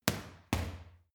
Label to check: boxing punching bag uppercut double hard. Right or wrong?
Right